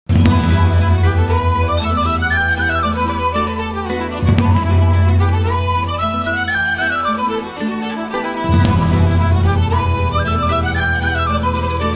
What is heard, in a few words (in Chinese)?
纪录片背景音乐